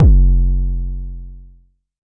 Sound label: Jomox MBase, drum machine; Jomox MBase 01